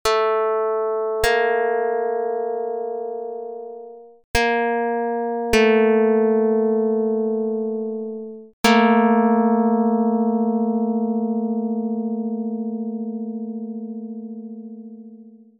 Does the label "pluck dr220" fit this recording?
Yes